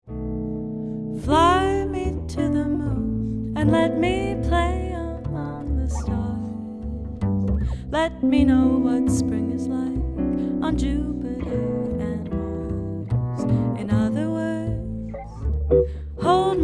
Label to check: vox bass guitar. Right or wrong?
Right